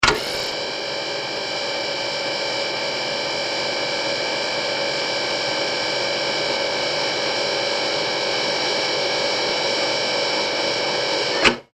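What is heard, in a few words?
Electric Motor Steady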